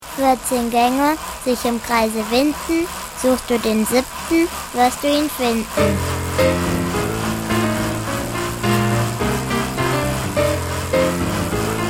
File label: speedhub ringtone; rohloff